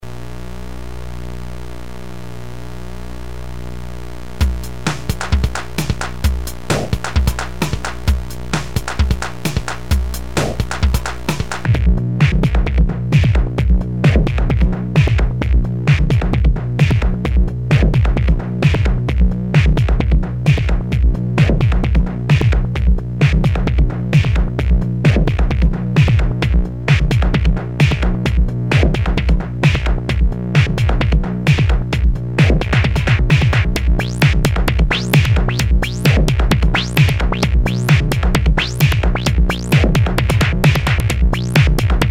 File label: Drum Session